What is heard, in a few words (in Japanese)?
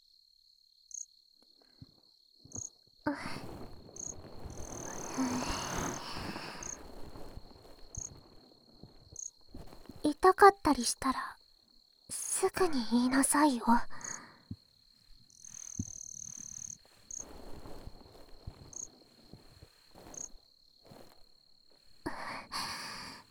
耳かきやってあげる, ASMR